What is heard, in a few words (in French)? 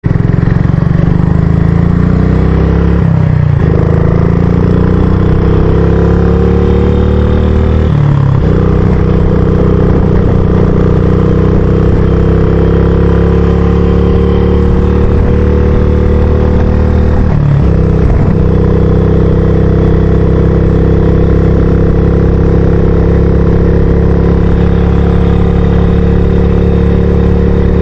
bruit de 250 bsac 11